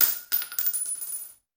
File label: Bullet Shell; Sound Ideas; Guns